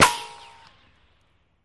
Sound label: Bullet Ricochet Sin